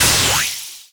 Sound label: Ball Return